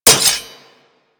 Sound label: Dagger Metal Impact; Dual Daggers; Hit Effects; Weapons